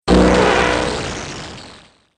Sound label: Alien Shockwave Splat